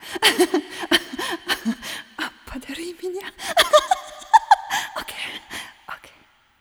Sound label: laugh